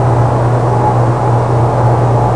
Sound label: machine; SIN; environment; amb machine